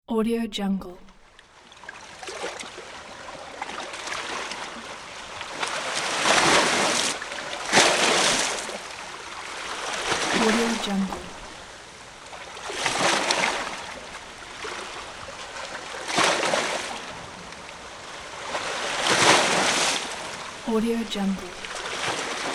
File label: river shore